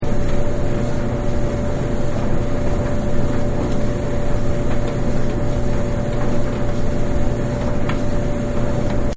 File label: Dryer Loop, Laundry; Household; Partners In Rhyme